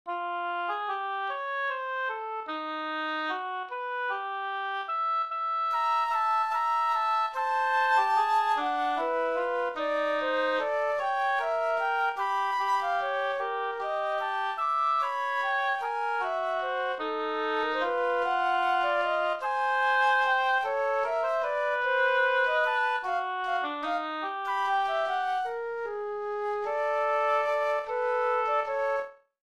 Divertimento for Flute and Oboe

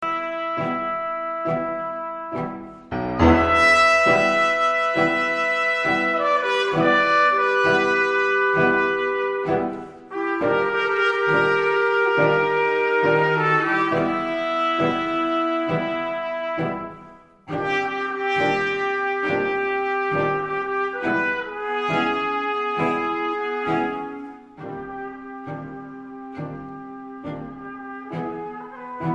blues

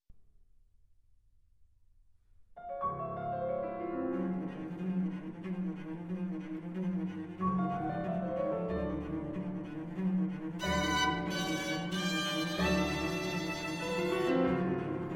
Trio

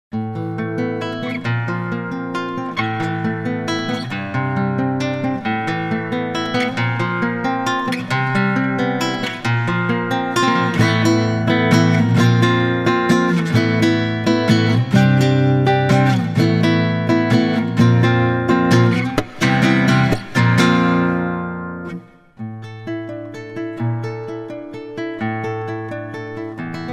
Strings

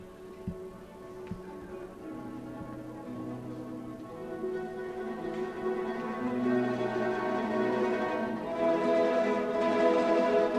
Cantilene nr. 1 in A

